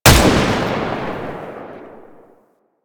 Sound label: Target Practice Shot; gameplay; Warframe; Nineteen Ninety Nine; Quincy